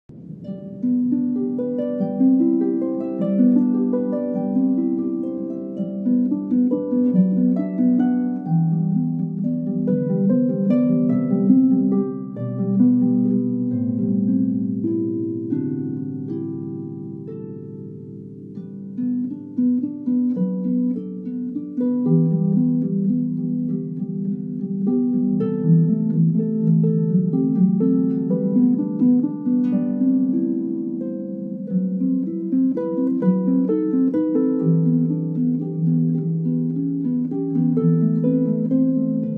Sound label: Harp